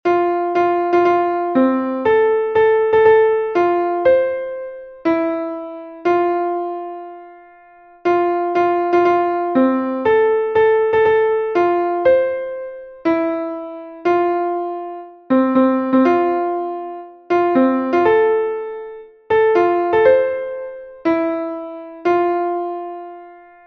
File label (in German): klavier; MelodieMeister